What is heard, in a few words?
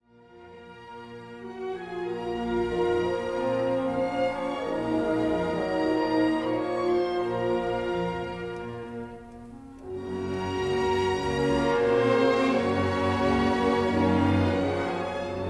Symphony In D Minor Feierlich Misterioso